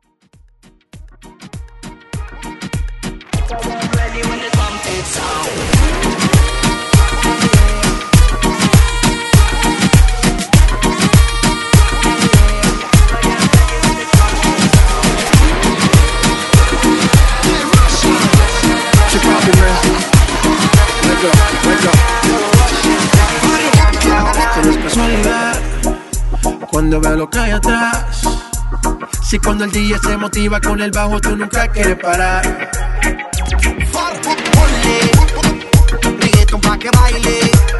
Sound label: Trumpet Moombah Mash Up Redrum